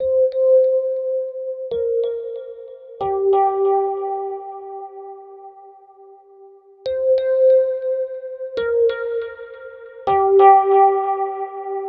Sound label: Dub Melodic Rhodes; KJ Sawka; Drum n Bass & Beyond